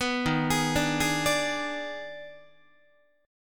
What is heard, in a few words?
Eb Major 7th Suspended 4th Sharp 5th, Guitar, chord; arpeggiated; EbM7sus4#5